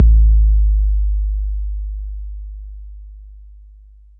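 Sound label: bass drum 808 Smooth C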